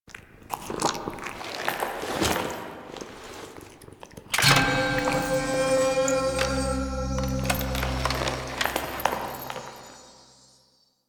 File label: Stinger; Warframe; Entrati Labs; Tagfer Retrieve Key